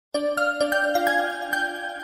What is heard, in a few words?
SMS; Galaxy s4